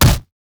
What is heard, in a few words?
body impact ground